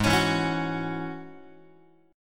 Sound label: G sharp 7th Suspended 4th Sharp 5th G sharp, Guitar; G#7sus4#5 strummed; x,4,4,2,5,5; JGuitar